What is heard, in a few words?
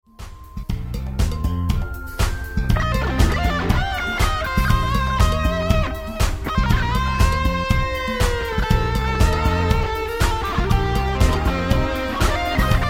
jam thriller